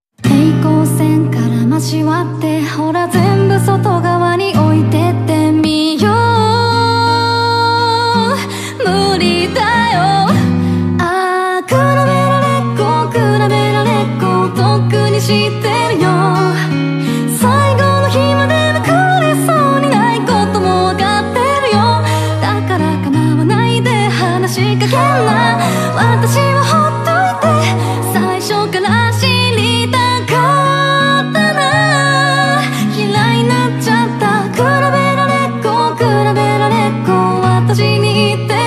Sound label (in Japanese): (Guitar)